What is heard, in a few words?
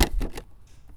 Plastic Thump; BB Retail Therapy Sample Pack